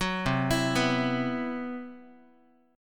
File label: C Major 7th Flat 5th C, Guitar; CM7b5 arpeggiated